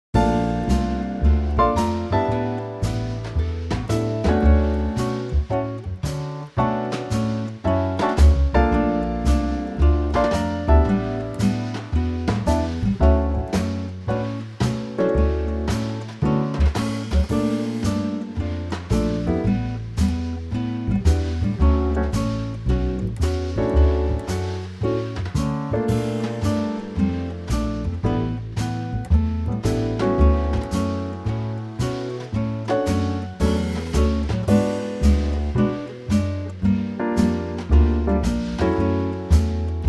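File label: D Quartet, backing track; key - D - vocal range - A to D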